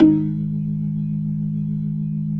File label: B-3, Jazz